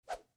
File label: Sword Whoosh Light